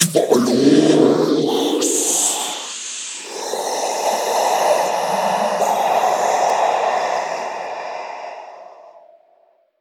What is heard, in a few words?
Voodoo Death; we are the dwarves gamerip 2016